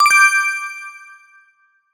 favourites timeline updated; TWBlue

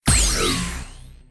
ready; FightingGames; TWBlue

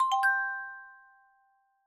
search updated; TWBlue

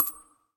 trends updated; FreakyBlue; TWBlue

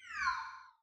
tweet received; Qwitter; TWBlue

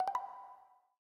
retweet send; FreakyBlue; TWBlue

